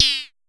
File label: Pollard Tom Hi, Syndrum